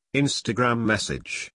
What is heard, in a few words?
Instagram message